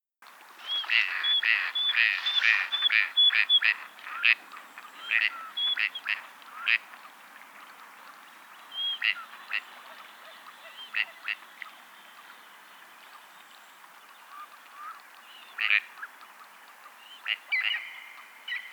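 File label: gadwall mareca strepera; seraya utka; utok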